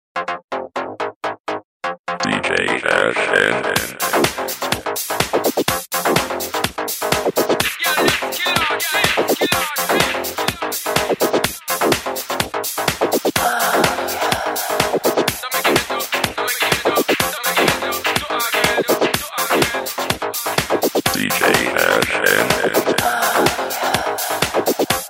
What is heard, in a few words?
night disco mix